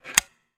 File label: Ventilation Bolt; miside demo